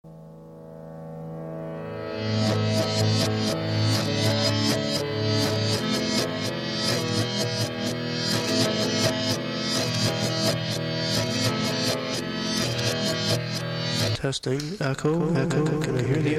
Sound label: echo test